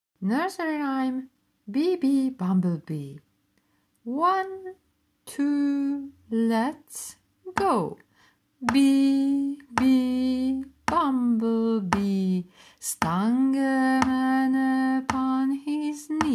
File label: bumble bee